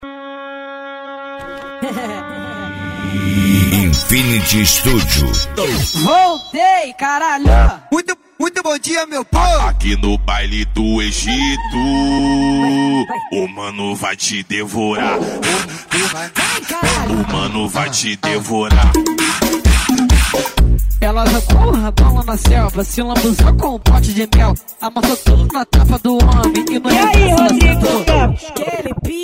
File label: EletroFunk Funk; Infinity Studio; Itaja GO